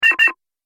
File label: Multimedia Double Button